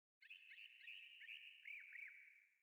sting songbirds; Rust

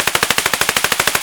m4a1 fire silenced distant loop; Escape from Tarkov

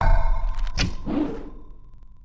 Build Crossbowman; Civ4 warlords

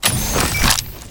Battery Boost; Titanfall 2

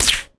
tunneler worm jump

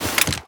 foley generic draw weapon

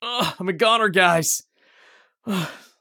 hurt near death; Escape from Tarkov

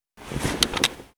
Inf Weapons Foley; Red Orchestra Ostfront 41-45

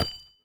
grenade launcher casing bounce soft

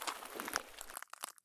prone glass; Escape from Tarkov

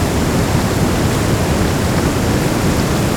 Amb Weather; Red Orchestra Ostfront 41-45

